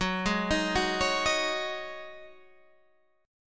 C sharp Suspended 2nd Suspended 4th F sharp, chord